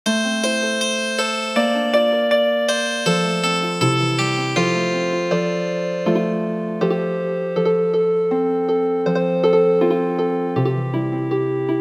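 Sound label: Healing Harp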